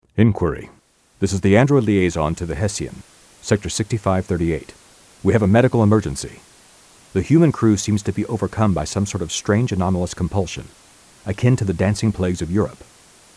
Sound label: Distress Signal